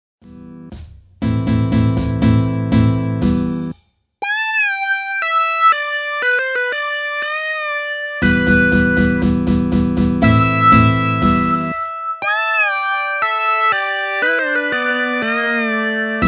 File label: Mosquito Buzz; Abstract Album by Rhett Buckley